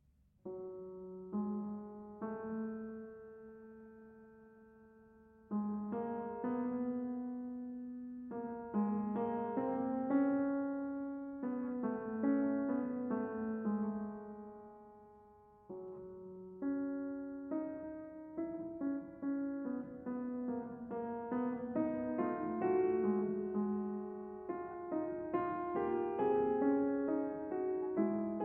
Prelude & Fugue in F-Sharp Minor, BWV 859 II. Fugue